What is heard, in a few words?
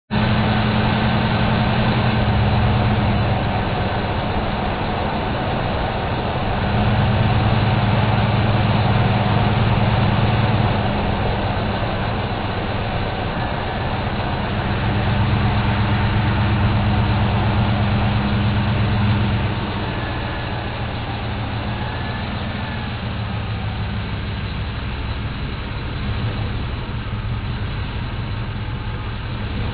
chevy